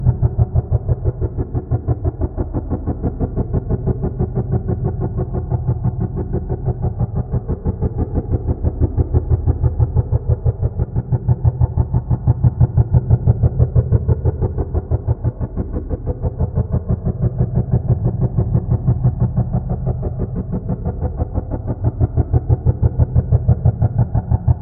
Deeper Slower Version Of Timbre Phasing Chopper Simulation